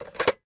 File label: Telephone Receiver Up; Communications; 1001 Sound Effects